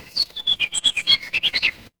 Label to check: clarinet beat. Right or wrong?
Wrong